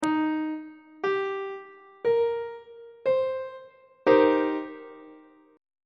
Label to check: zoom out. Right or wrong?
Wrong